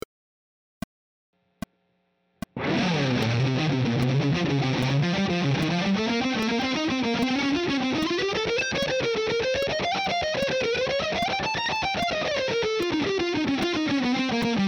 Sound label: altered scale, guitar